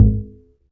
Upright Acoustic Bass; 1ST POSITION; Larry Seyer

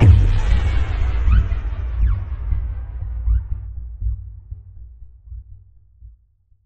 Impact; impact samples

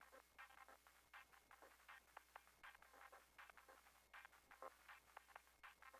Noise Beat; Beats; Drums & Drones

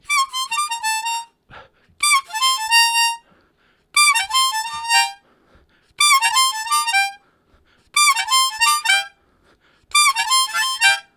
High Repeated, Harmonica Riffs; Zero G

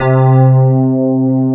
pad piano; East West - Global Sounds; S.I KEYS

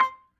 C4 PERC, B3

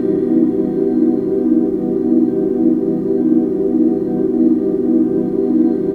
Origin Pad, Jungle; M8

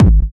Kick, Kickdrum; Equipped Music; Loungin House

